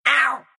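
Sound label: pain, Russian; dota2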